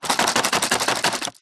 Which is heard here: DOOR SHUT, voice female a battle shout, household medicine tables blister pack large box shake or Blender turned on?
household medicine tables blister pack large box shake